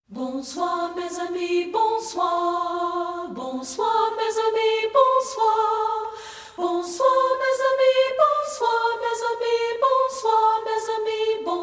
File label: A CAPPELLA FOLK SONGS FROM AROUND THE WORLD; BONSOIR